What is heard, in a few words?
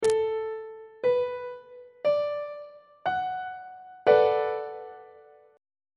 c flat minor 7th 3rd inversion on treble clef